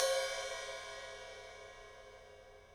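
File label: ride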